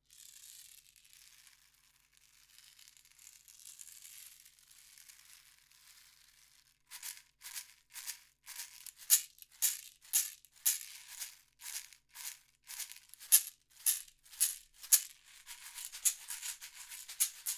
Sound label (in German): caxixi gross